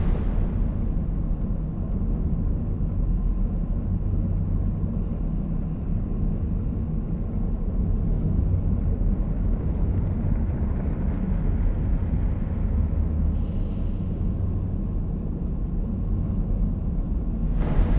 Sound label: ship mining station idle; aquatics